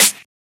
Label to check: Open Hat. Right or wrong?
Right